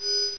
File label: camera start, turret; hl2